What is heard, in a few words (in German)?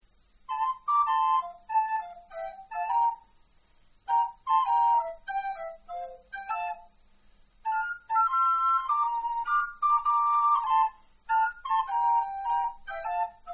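Sopranblockflöten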